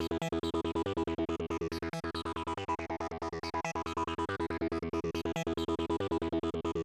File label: Selection Kit 140BPM Syncer Arp DRY, E minor; Melody & Synth; Vengeance Samples